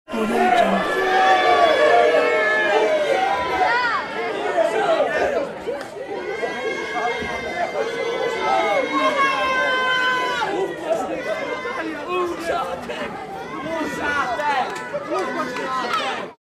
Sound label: disappointed applause